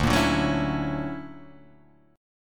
D Augmented Major 9th down, guitar; D+M9 strummed; JGuitar

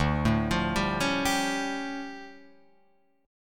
D-Minor Major 11th, D, Guitar, Drop D

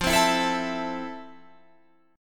F Major 7th, Guitar, Open F; strummed

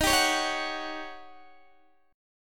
D sharp 7th Sharp 9th Guitar DADGAD

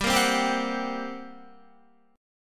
G Minor Major 7th Double Flat 5th, G, Guitar

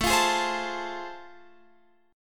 A Minor Major 7th Sharp 5th, Guitar Open F; AmM7#5; strummed; x,16,17,15,15,16

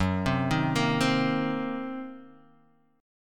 F sharp 6th Flat 5th F sharp down Guitar Drop D

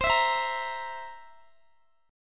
C 7th C down Guitar Open D

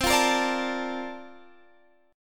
Bb Major 7th, C; Bbmaj7/C; strummed; JGuitar

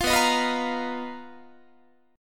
B Major 7th Flat 5th B down Guitar; strummed

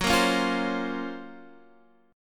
F sharp Major Sharp 11th F sharp; F#M#11 Chord; strummed; x,9,8,6,6,8

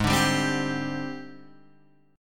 G sharp Major 7th Flat 5th, Guitar, Open G; 6,7,5,5,8,5

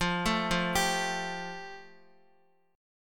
F Major Add 9th down, Fadd9 chord, Guitar; arpeggiated; JGuitar

